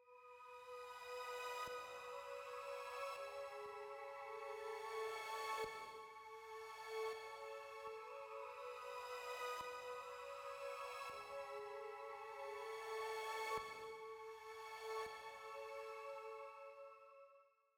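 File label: 121 BPM C# Minor Pad, Pop; Melodies; Loop Stems; Arena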